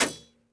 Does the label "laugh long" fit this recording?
No